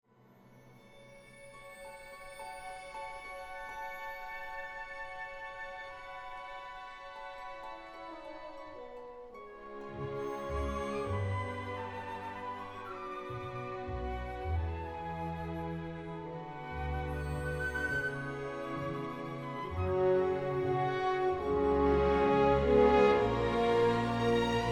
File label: Romantic Romantic Epic Magical Dramatic